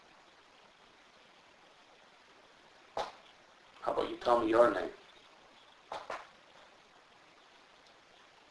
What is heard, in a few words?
clapping